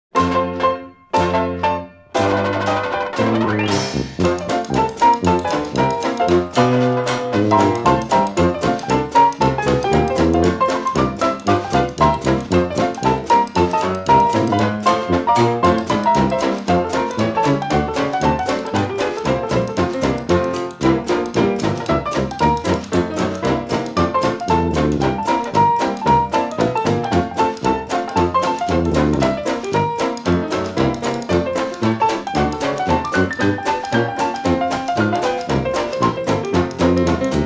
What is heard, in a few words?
ragtime